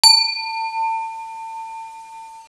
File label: chime